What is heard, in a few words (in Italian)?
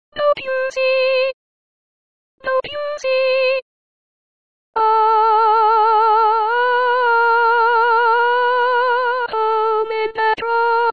soprani